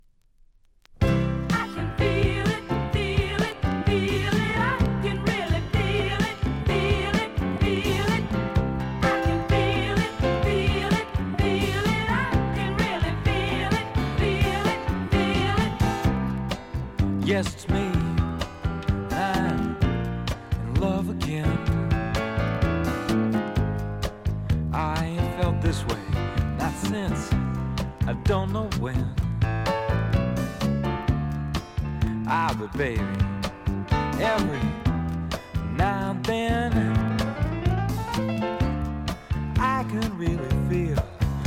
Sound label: feel groove 45rpm